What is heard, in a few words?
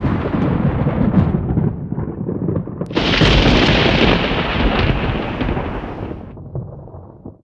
Volcanic eruption; EnvSound